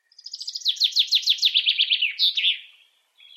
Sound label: bird